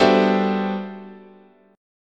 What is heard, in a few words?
G Major 13th, guitar chord